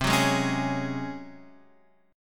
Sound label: C sharp Major 7th Suspended 4th C